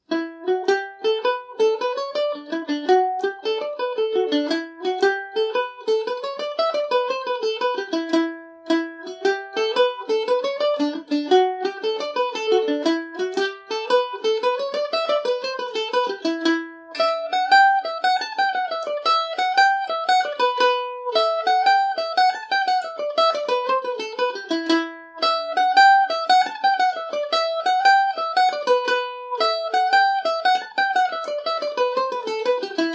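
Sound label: E minor, Irish mandolin; lanigans ball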